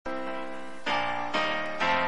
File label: melody